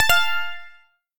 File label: Mission Success; Pac-Man Championship Edition 2 Plus